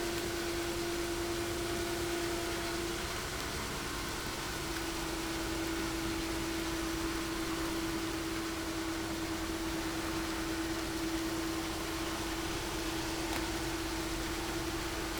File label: No Ship, helicopter